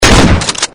shotgun; slender; cof

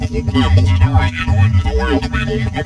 scare; slender; fivenights freddy; fazbear

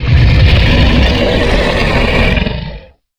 roar; slender; garg

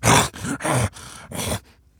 pain; slender; zombiebm

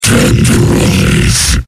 fleshpound alert; slender

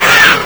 pain; slender

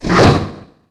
garg roar short; slender

